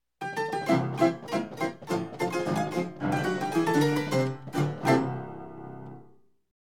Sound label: Piano; Instrument Sounds; sound play workshop sounds